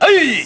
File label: attack, electro